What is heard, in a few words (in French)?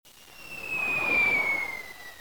Sifflement d'1 bombe; bruitage; Armes